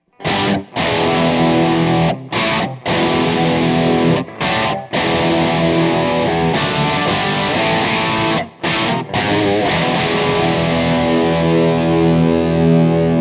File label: Hi Gain Backin